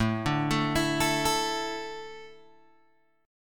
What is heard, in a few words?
A Suspended 4th, Guitar Open G